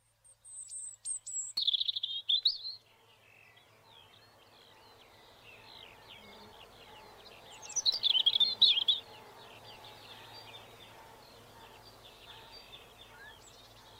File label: birdsong robin